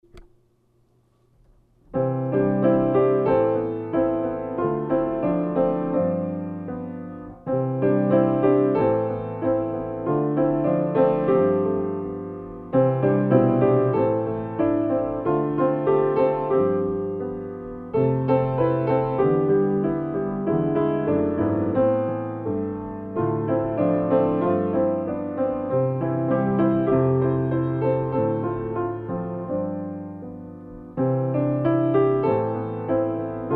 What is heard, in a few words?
Piano Accompaniment